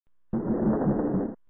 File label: roar